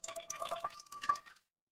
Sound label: water pour